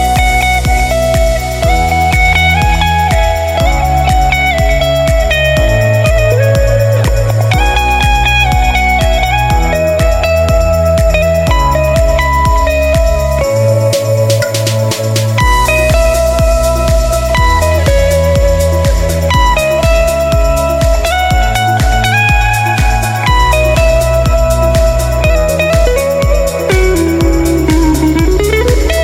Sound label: Huawei Ringtone